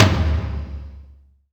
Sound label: CAN HL, Drum Mashines, SR-16 DRUMS; EdgeSounds; Drum Mashines VOL-1